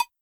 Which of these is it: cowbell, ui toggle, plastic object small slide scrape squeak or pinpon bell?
cowbell